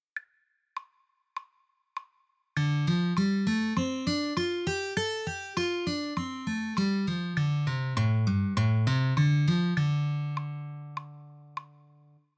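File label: C minor form pentatonic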